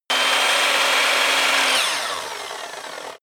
table saw free

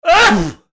sneezing

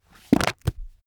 heavy book shut

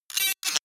sci-fi electromechanics small stutter evil

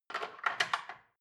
dishwasher cutlery grab

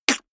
beatbox snare harsh

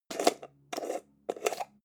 jar plastic midsize open slow

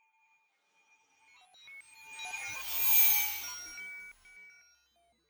fly by drone digital long

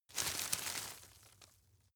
grab crunch medium

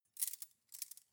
cutlery cake forks handle short soft

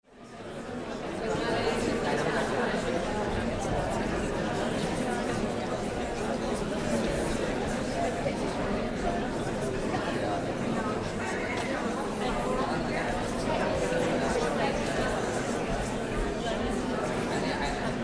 hall mid atmo

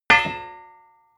hammer hit drop

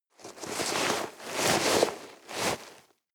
nylon leather walk

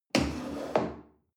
chair wood place slide medium

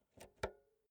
insert jack multi socket slow

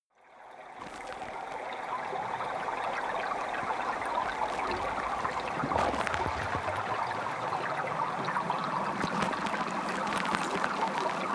water fx crackling

